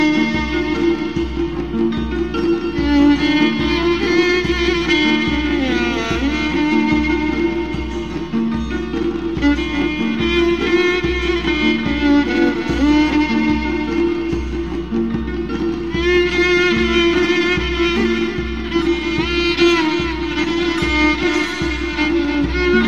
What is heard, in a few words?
Violon